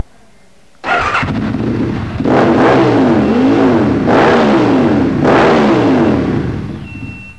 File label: RSV Mille Startup, Aprilia, Moto; rali